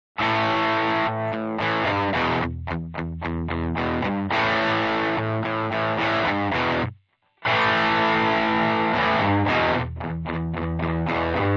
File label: air